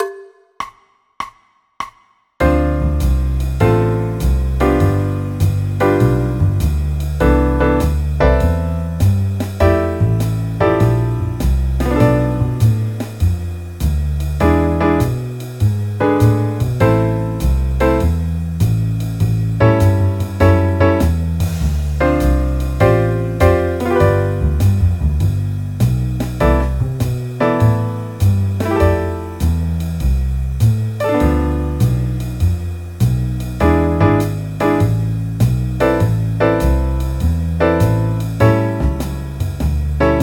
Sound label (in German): a moll akkordverbindungen